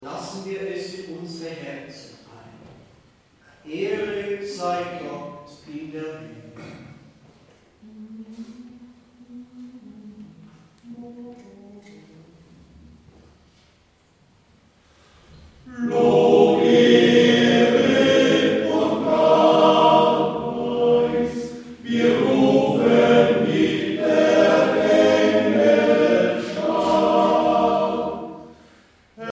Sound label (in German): männerchor